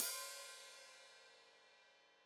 Hat Foot Open, Hi-Hat

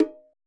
perc, Roland MC-909

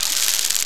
Crowd; PGA Tour Golf

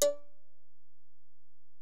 Bell, Alesis DM-PRO

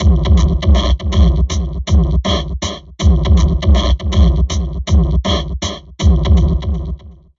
Drum Loops Crazy Processed

